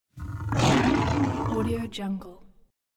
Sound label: lion roar